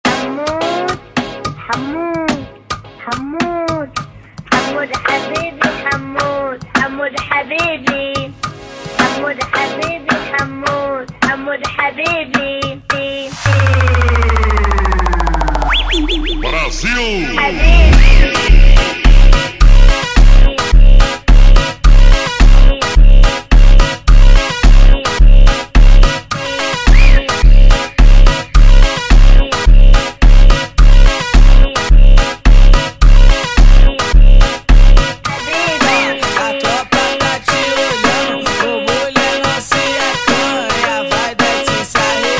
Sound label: Funk ultra Slowed